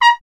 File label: BRS TP A#0, Trumpet; Miroslav Vitous